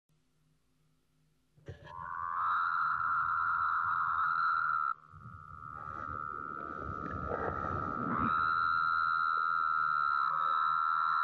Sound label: Wyoming toad Call